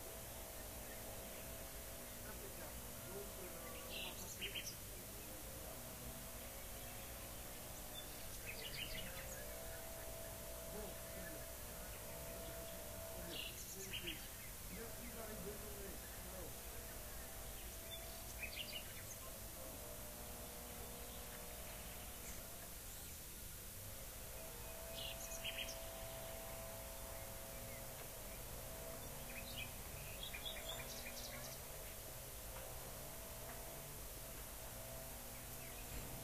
rayol farm; SEAMED

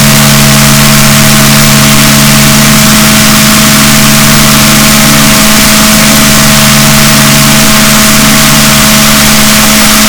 rorquals negatif, boat

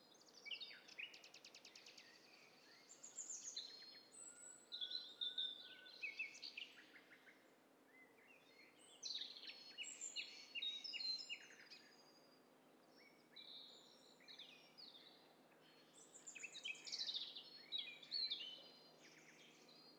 STEREO BIRD COCKTAIL PARTY

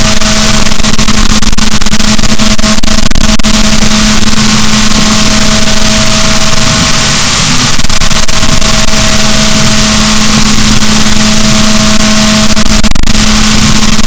rorquals negatif, boat

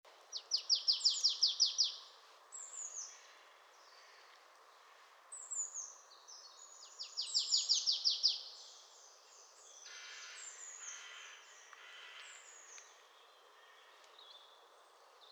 Poecile palustris; SETE

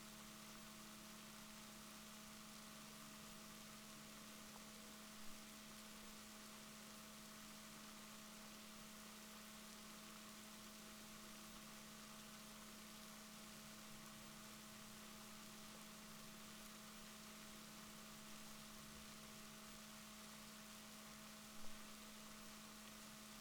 rorquals negatif, Balaenoptera Physalus Chile

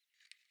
DRONES

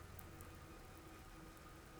pulses, BALAENOPTERA; MONACO 2022-07

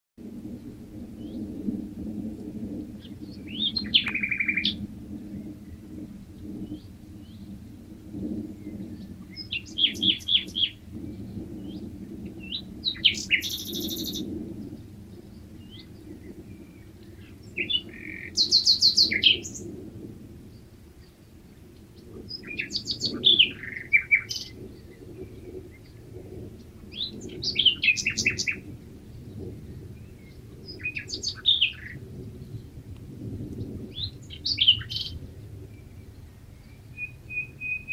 Luscinia megarhynchos; SETE

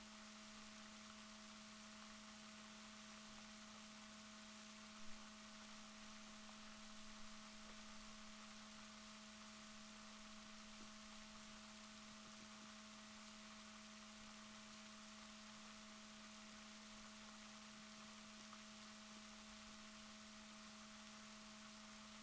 rorquals positif, Balaenoptera Physalus Chile

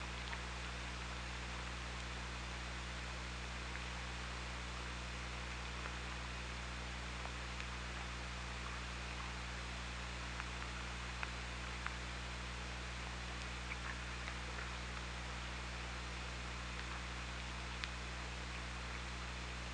OrcaLab

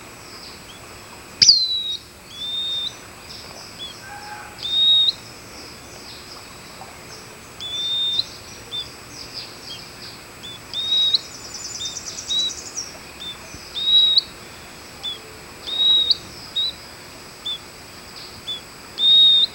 bird

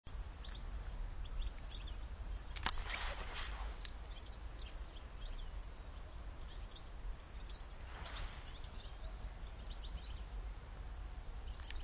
Carduelis carduelis; SETE

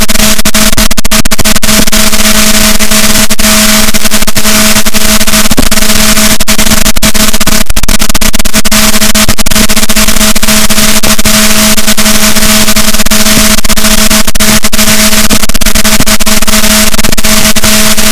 rorquals, Balaenoptera Physalus, boat

